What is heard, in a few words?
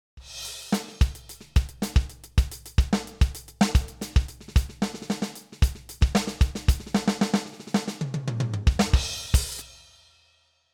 Millenium MPS450 Rock